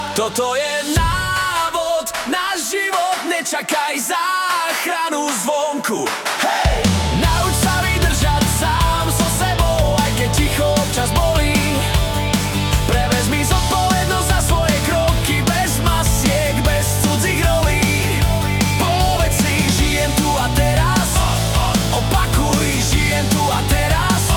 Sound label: poprock